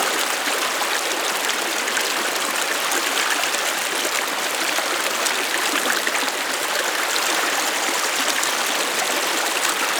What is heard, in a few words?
Waterfall Medium Loop; Ambience; Props; Warframe; Eidolon Plains